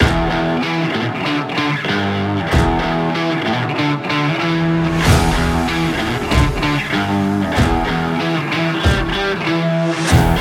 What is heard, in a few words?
Funk Rock; background music